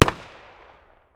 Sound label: pistol 45 medium distance; tacz-tweak-pack; taczmechanics